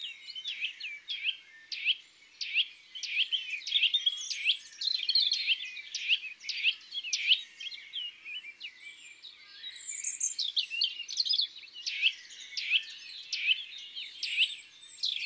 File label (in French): Sitelle torchepot, Chants d oiseaux des bois et des forets